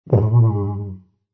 TUBA COMEDY

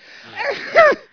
people

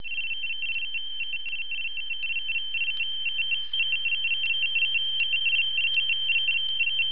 scifi

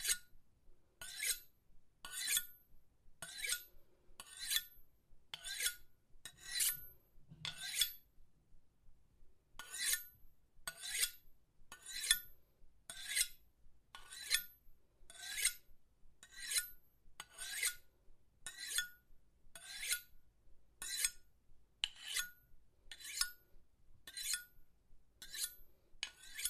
knife sharpening

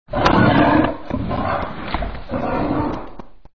bear

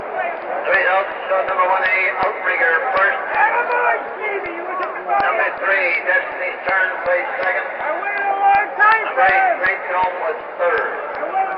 horse race

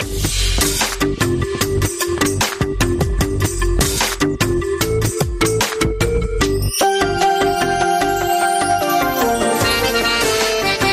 ringtones nokia

